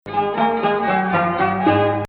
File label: 2nd Rast, maqam